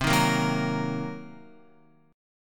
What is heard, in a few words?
C Minor 7th Flat 5th, Guitar; strummed; 4F,4A,5C,5G,5C,6E; JGuitar